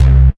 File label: Bass C, Offbeat Bass